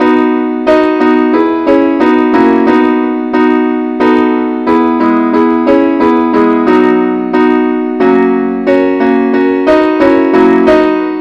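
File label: advanced accompaniment key B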